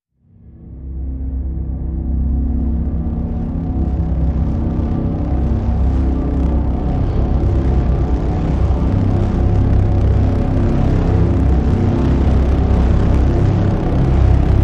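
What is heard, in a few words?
Drn C, drone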